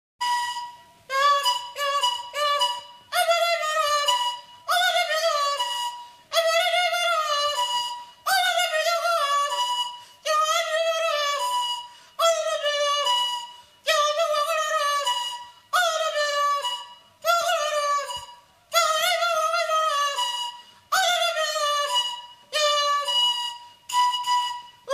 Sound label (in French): Papouasie Nouvelle Guinee Chant Flute